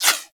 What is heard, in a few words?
Laser Whizzby; Warframe; Bullet Impacts; Whizzbys